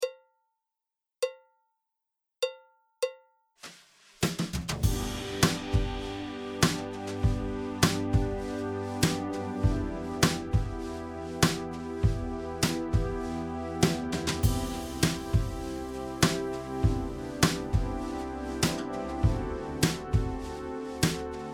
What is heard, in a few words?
Chord Key of G